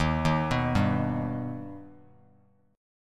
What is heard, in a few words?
Eb Augmented Eb, Bass Standard; arpeggiated; JGuitar